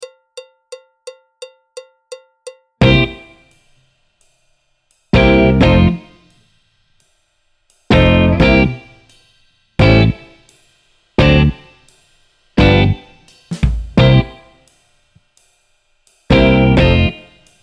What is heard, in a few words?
alto, trombone, piano; Jazz Syllabus Level 4